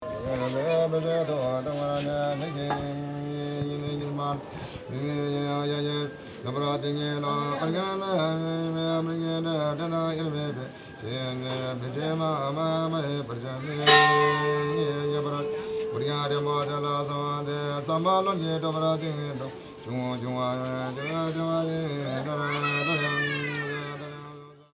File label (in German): Buddhistischer Mönch betet Mantras